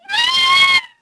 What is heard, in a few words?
Gali Pain, Dino